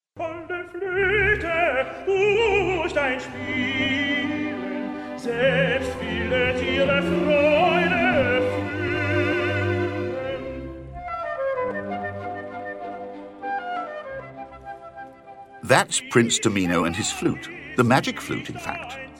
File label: FLUTE